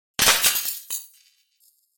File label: glass; uhe zebralette3